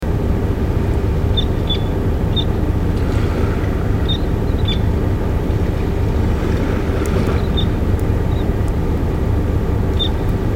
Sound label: least tern